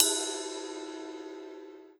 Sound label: Ride Fus, EMU Orbit-3 drum machine; 300 Drum Machines